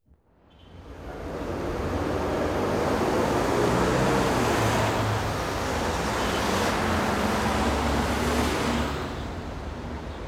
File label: City Traffic